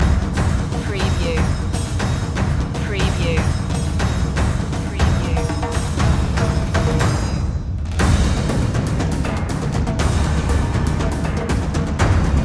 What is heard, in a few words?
percussion loop